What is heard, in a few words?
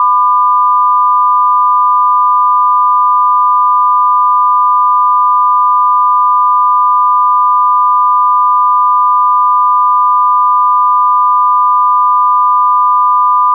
intermod 1k0+1k2; intermodulation